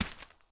Girl footsteps; Robobeat

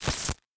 Glitch

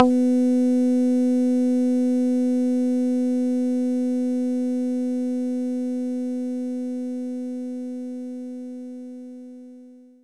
Muted Guitar B3; knights try

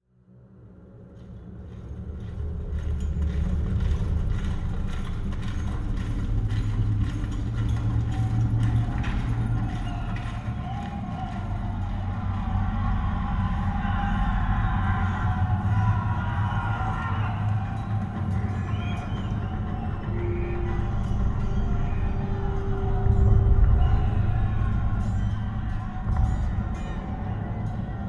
Battleground Bg Loop; chivalry medieval warfare 2012 pc

